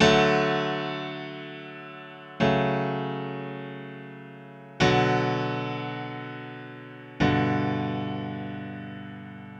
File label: Grand Piano - 100 BPM (B Minor); Cheers; Ghosthack; Free Guitar And Piano Samples